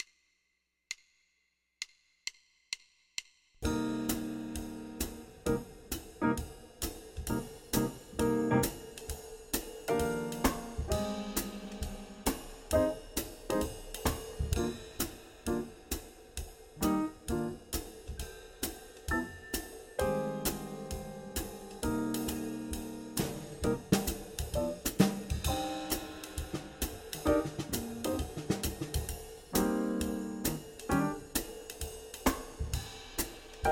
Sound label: blues bass